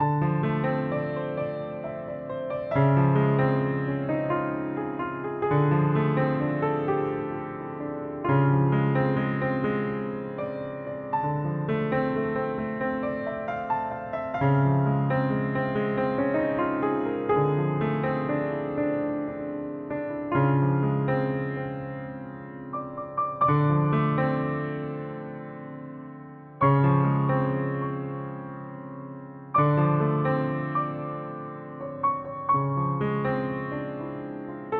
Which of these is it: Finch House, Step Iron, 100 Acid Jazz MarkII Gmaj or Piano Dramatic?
Piano Dramatic